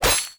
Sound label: sword hit